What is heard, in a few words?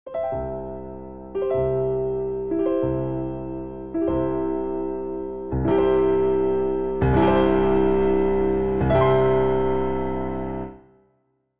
Ending Descending Inversions C Major 100bpm, Grand Piano; MIDI Clips; Ableton